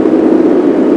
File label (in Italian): Vento, natura